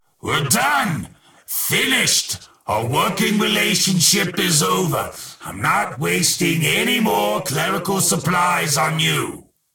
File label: map completed; tf; deathwish